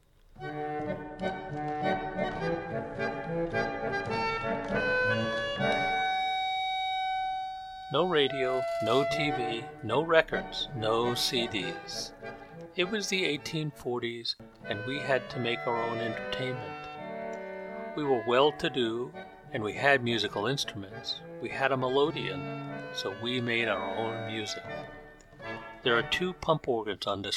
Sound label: melodeon